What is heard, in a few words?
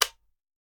Machine Gun Foley; Sound Ideas; Guns